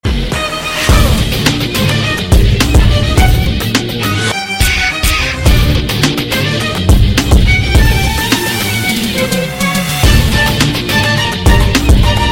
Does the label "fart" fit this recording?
No